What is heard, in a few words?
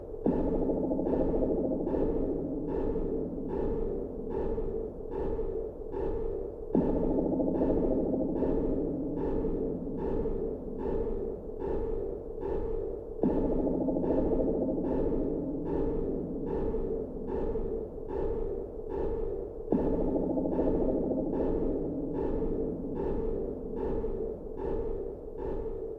beat